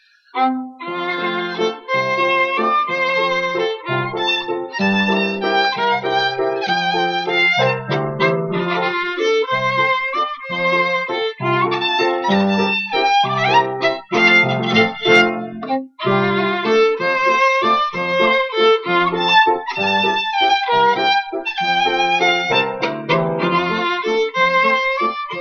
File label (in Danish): vals i f dur; peter musik og libbe; dr2 1974